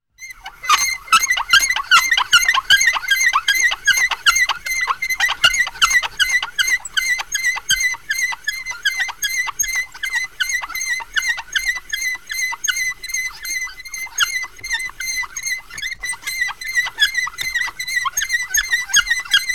snijbonenmolen

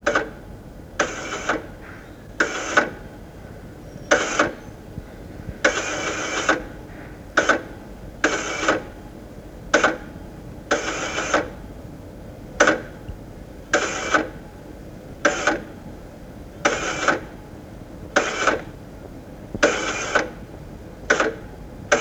telefoon kiesschijf

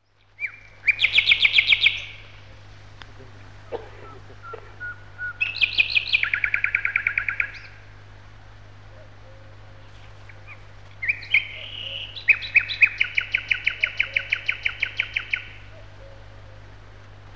nachtegaal

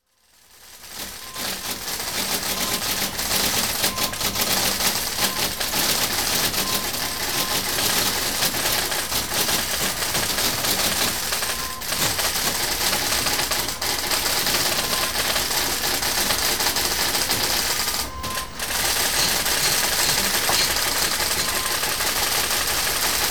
typkamer

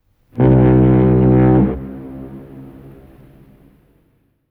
stoomhoorn lijndienst

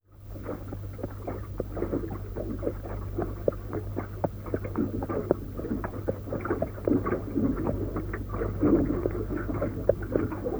trekschuit paard

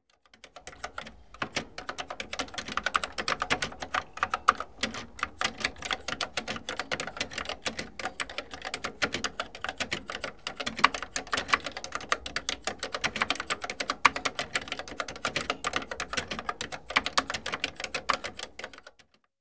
telex

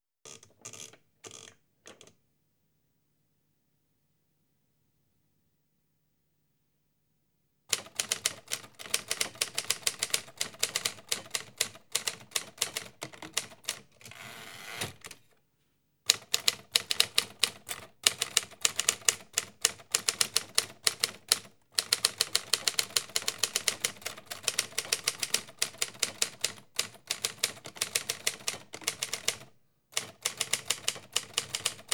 typmachine mech briefs

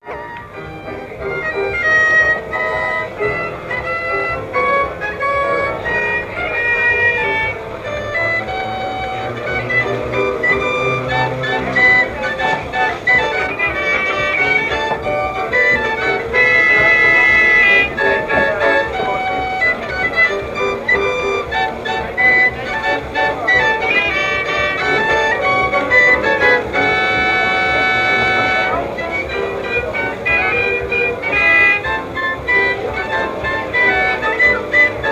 kermis bios mech orkest

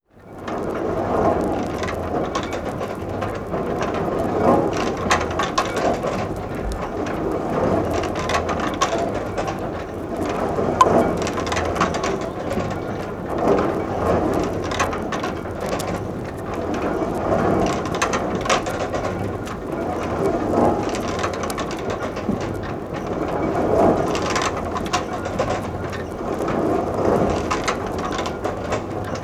molenstenen malen

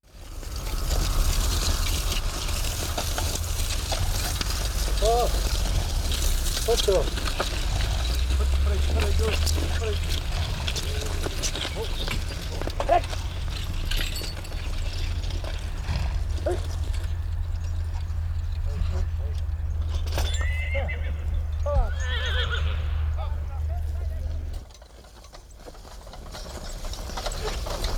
paard met ploeg en boer

